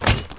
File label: packs pickup Pack; Tribes2